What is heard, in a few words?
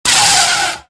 saber block; weapons